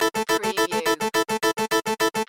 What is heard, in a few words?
Casino Slot Machine Harpsi